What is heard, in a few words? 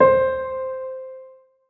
INSTR Winter (C); Melodic One Shots; Cymatics; ANALOG EVOLUTION Beta